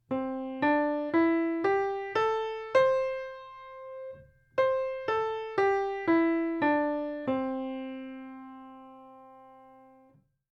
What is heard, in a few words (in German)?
Dur Pentatonik